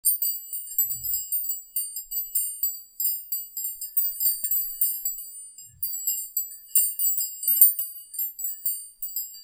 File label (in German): Klangspiel Modell Xian 5 Klangroehren; xian 5 tubes